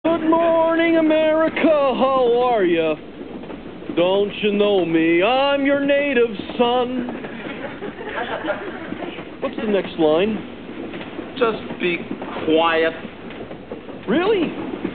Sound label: Freight train